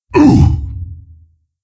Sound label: Wound, Creature; Steelbreaker